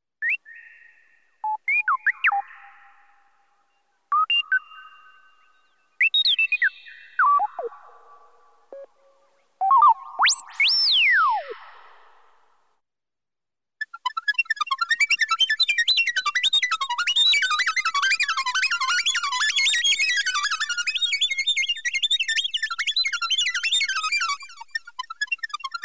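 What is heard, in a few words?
computer sounds on a spaceship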